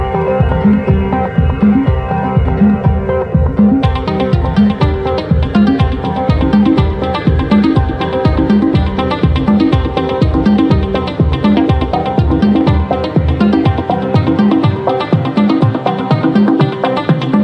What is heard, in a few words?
Arabic Music